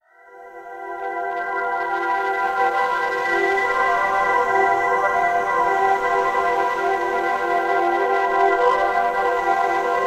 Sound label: Hybrid Instrument Distortion; Reason Studios; Reason 11; Scenic